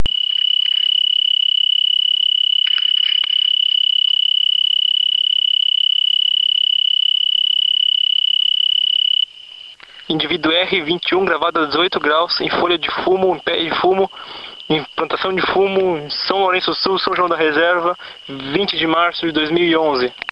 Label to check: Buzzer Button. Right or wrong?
Wrong